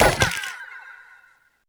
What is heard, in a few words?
Prize bubble; ps3 gamerip 2011; LittleBigPlanet 2